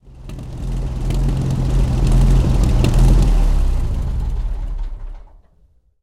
comet engine stop; War Thunder

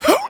vce effort large

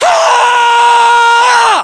melee charge

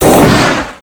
fire pipe burst; Explosions

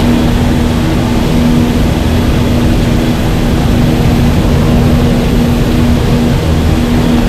engine tvd cockpit l, engines; War Thunder

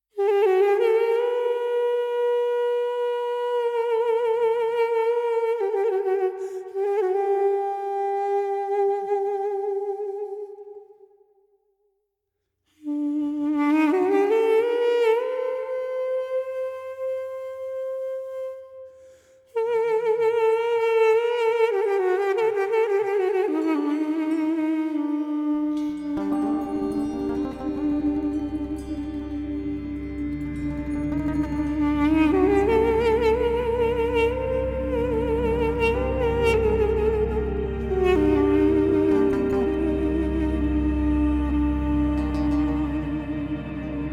Arabia, Middle East Africa War Music; Civ5

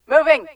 moving; Titanfall 2; Pilots

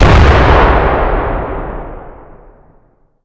Amb Destruction; Red Orchestra Ostfront 41-45

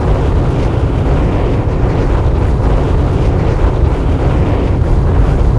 explosive objects; World of Tanks

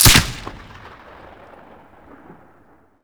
grenade launcher fire

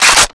ak74u reload chamber; foley; Call of Duty Modern Warfare

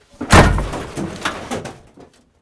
Vehicle Hatches; Red Orchestra 41-45

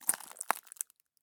walk glass; Escape from Tarkov

